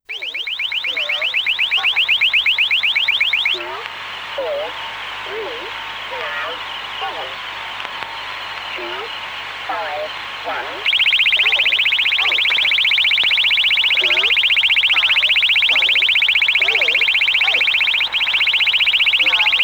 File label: jam